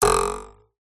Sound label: cartoon ruler twang metal